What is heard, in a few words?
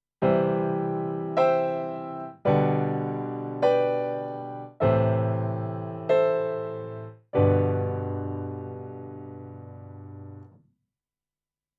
UA Volt Grand